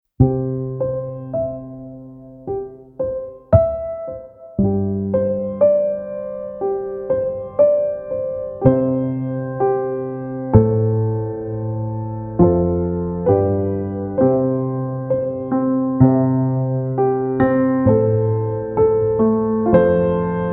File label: Flying Song Instrumental; The Boy, the Mole, the Fox and the Horse: A Musical for Schools; intended to be used as a backing track for your performance